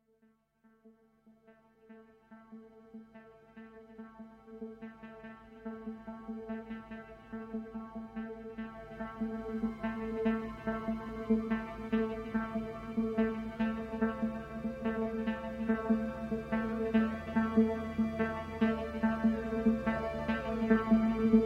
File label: Sequence Glide, synthesizer